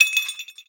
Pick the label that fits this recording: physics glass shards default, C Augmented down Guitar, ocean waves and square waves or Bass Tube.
physics glass shards default